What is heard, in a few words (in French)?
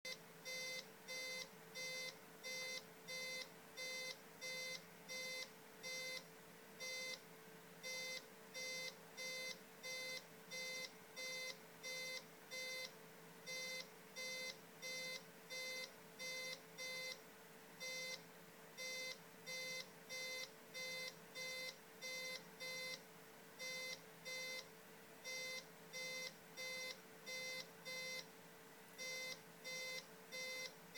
Beeps sur MSI 760GTM-P33